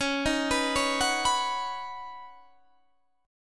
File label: Db 7th Suspended 2nd Suspended 4th Db down Guitar Half Step Down; Db7sus2sus4 arpeggiated